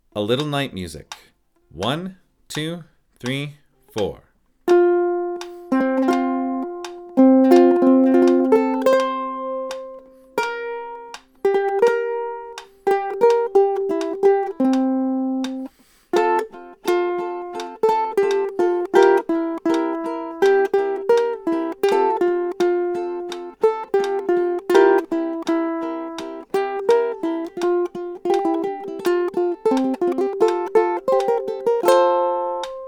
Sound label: A Little Night Music, ukulele